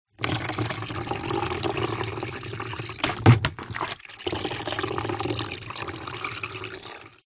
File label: household mop in plastic bucket pull out drips x2